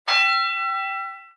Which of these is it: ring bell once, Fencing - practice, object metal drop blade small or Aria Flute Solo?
ring bell once